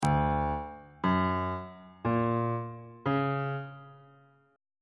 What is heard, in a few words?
b melodic minor 7th chord 3 is d augmented major 7th on bass clef